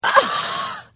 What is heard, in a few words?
Hit